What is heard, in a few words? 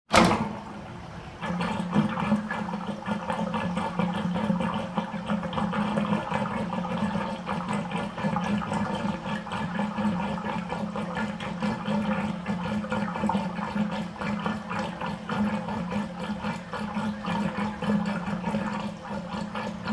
WATER BATHTUB